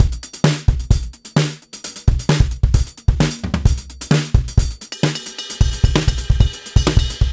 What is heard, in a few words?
131 live kit